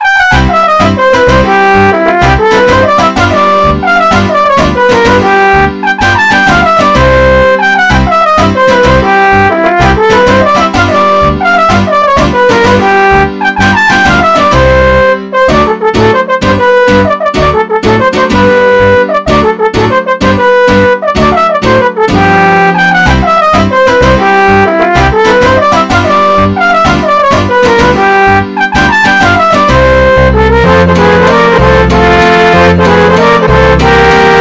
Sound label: Mexican Hat Dance